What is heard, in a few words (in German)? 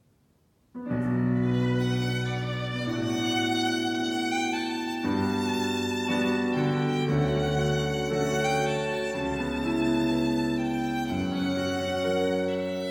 Violine